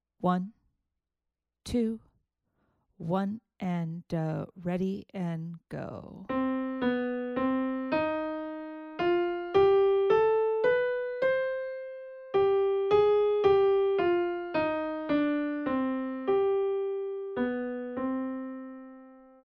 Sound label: melodic dictation